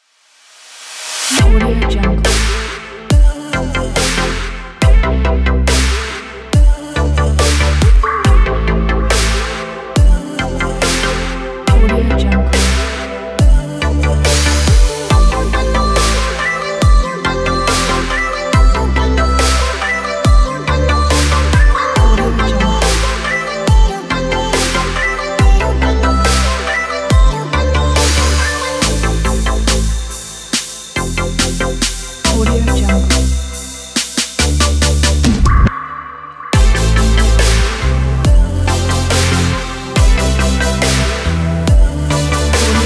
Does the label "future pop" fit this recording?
Yes